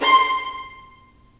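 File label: STR, Roland String Master Series, Violins Marcato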